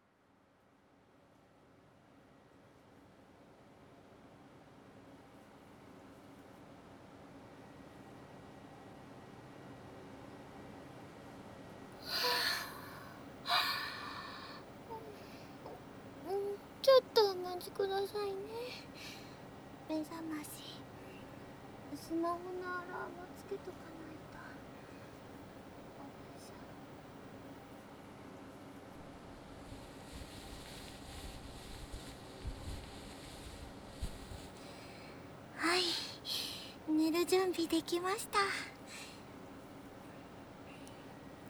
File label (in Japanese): 心音・ハグ・添い寝